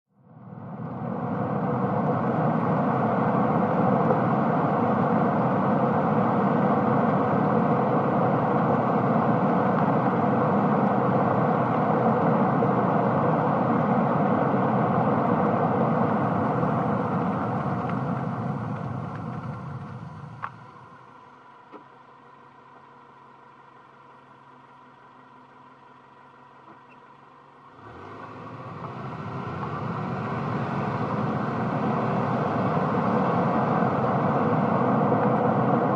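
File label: Small Car At 25 35 Mph, compact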